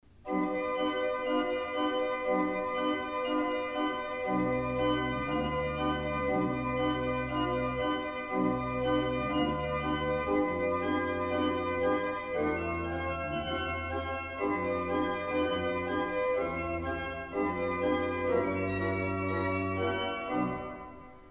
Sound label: Mertel